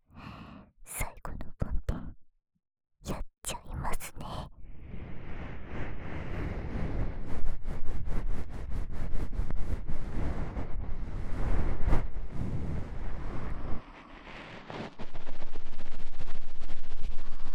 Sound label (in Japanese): 【右】梵天高速(終), 耳かき, ASMR